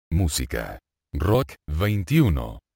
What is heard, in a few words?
rock